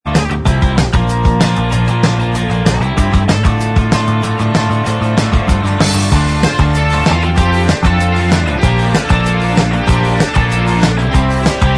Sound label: Inst Track